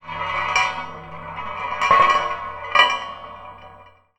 Hi hat, contact mic, Marbles; musicradar; contact mic madness; Raw

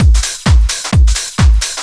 drum 130 groove; Techno Trance Essentials

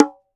BONGO LO OP; CUBA

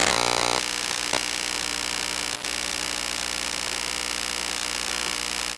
Noise, Pick Up Coil Noise; contact mic madness

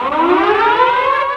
ALARM; Best Service; XXL Production Effects; ALARMS; Sample CDs

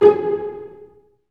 STR SEC, STRINGS; Roland - LA Composer vol. 1

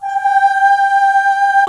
MIXED CHORUS

